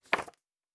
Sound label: Book Page; Fantasy Interface; Fantasy Sounds Bundle